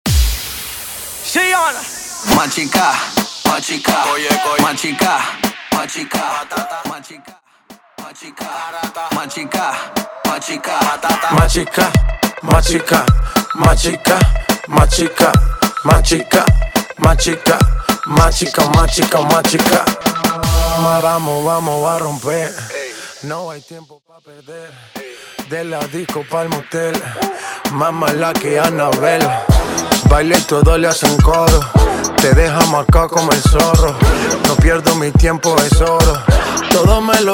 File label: Acapella Starter 106BPM